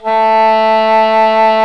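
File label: A4 ENGLISH HORN; ORCH.COMP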